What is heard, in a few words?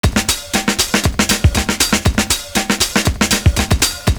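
DISCO JUNGLE, 119 bpm; Univers Sons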